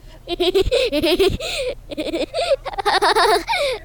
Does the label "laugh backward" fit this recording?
Yes